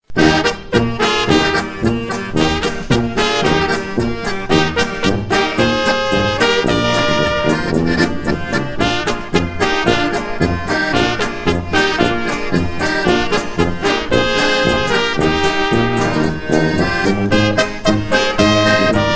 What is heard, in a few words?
trumpets and clarinets polka